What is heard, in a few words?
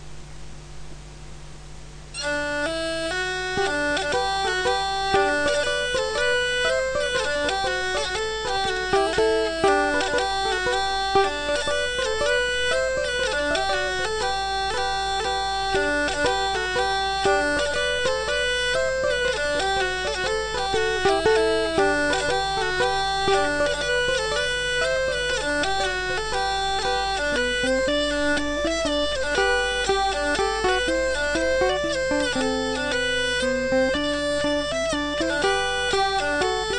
mazurka d'Auvergne